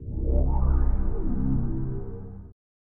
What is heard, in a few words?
Intro Jingle; Kyle Petty's No Fear Racing; Circuit USA; SNES